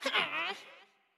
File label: Wimp Aggro